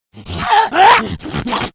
rage, infected, female

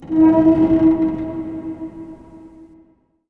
metal scrape verb

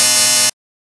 blinking alarm clock; spacial loops

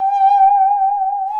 saw danger, music, zombat

